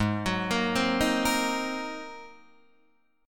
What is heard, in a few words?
G sharp 9th, Guitar, Open D